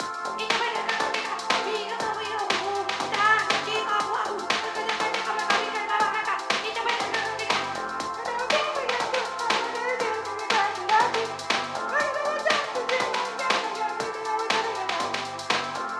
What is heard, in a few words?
Radio Loop; My Grumpy Funny Virtual Pet; android ios